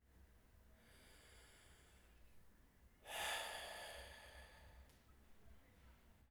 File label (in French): SOUPIR; Hadjithomas Joreige